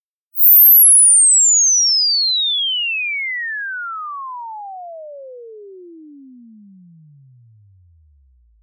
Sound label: Inv Sweep 20 20KHz